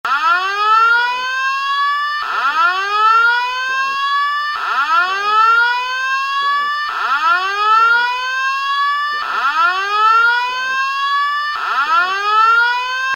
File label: fire